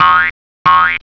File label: Bunny; SimTown 1.1